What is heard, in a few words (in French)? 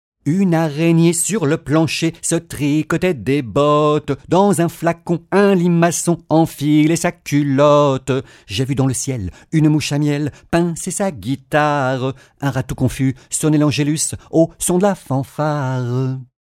comptine chant une araignee sur le plancher